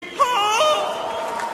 funny fail; detroit tigers game

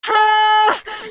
death; orianna

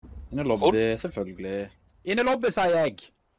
lobby

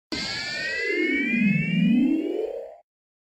flying nimbus notification sound; dragon ball

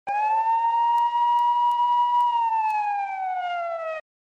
siren

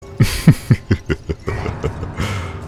laaaugh

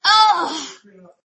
aaaoooowwgghh

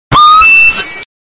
spoopy anime song

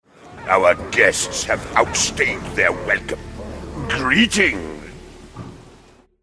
stream alert host

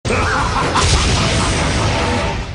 laughs; shredder

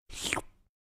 slurp sound effect

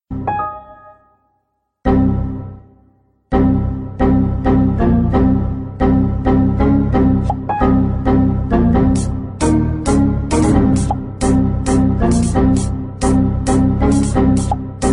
windows error remix

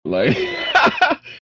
laugh; roach

